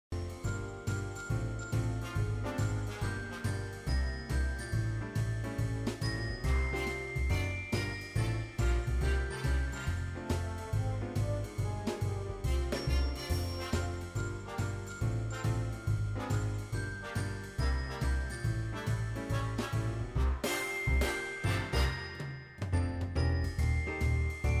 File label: 12days loop mono; christmas jetman collector